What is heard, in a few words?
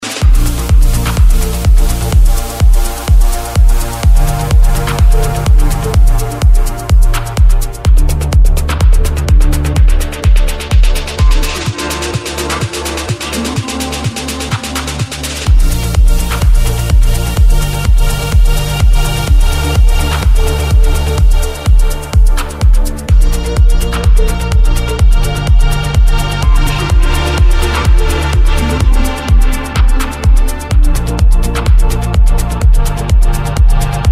progressive techno house